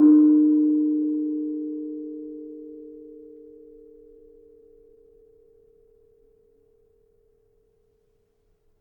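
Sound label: Kenong 4 D sharp, gravity harps tuned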